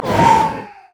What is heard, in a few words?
item sightward ghost; LoL